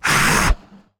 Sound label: guardian hurt; mobs; mobs mc